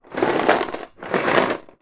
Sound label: leisure board game game box tilt movement; rummikub